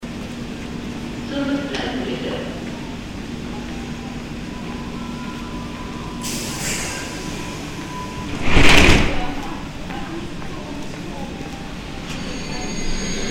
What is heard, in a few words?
subway departure